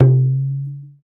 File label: Talk Drums